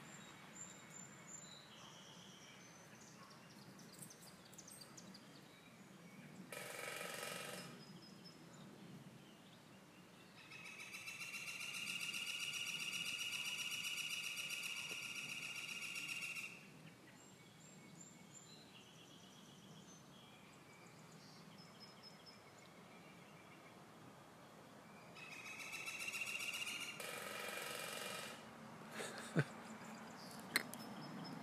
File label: Determined Woodpecker